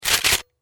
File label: UI Gun Change; One Shot Fishing